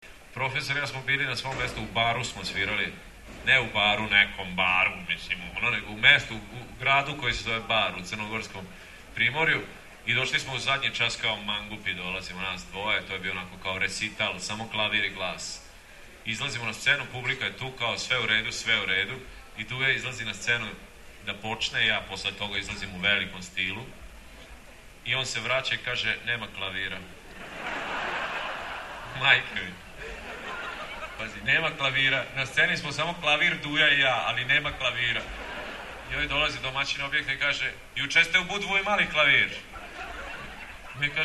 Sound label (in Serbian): Klavir u baru